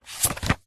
household book thick hard back cookery page slide turn; zapsplat